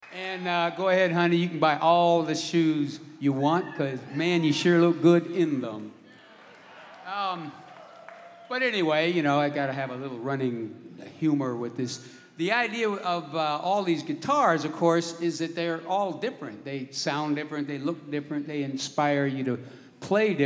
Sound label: Guitar Moment